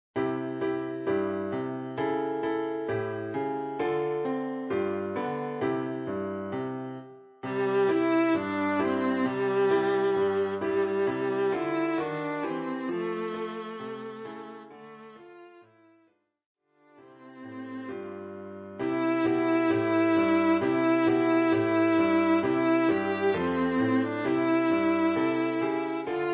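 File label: viola; Jingle Bells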